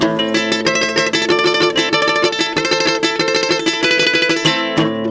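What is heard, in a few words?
OCT GUIT MEL, OCT GUIT MELODY; Best Service; World Colours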